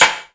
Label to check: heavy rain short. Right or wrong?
Wrong